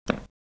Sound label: power off; NEC PC-6001; PC6001VW3